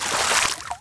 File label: splash; farm; breakout